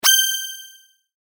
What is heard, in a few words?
sword